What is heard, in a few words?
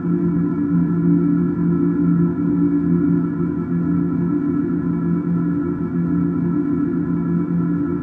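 machine ambience; Five Nights at Freddy's; FNAF 4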